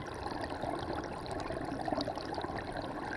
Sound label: Waterway E Dark